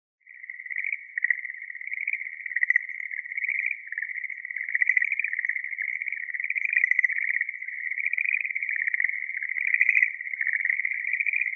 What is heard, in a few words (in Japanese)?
suregeru-aogaeru